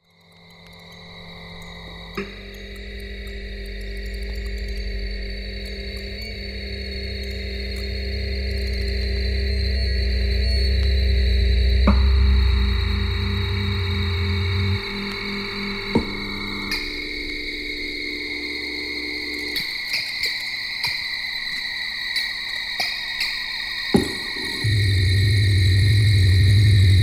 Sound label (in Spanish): musica electroacustica espanola